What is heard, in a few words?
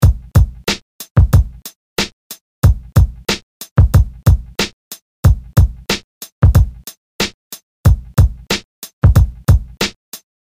Swing Example, Here is what the drums sound like with no added Swing, and then Swing added in; 53% Swing; FL Studio